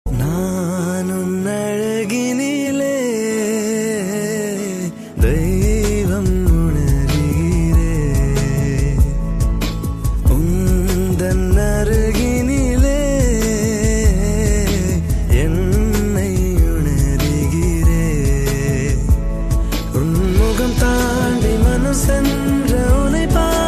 Male Version Ringtone

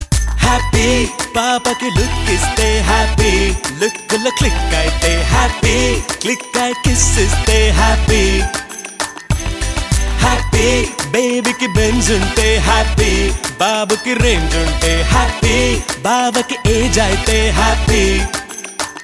Theme Ringtone; Happy

